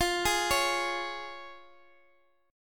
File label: F Minor Sharp 5th F down